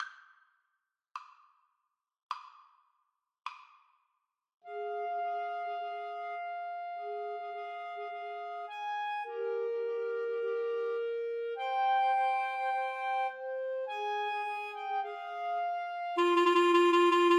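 summer 2nd movement four seasons clarinet trio karaoke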